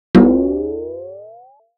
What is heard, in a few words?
Metal Hit Pulsating Hit - Rising; Hollywood Edge; sound designer's toolkit v2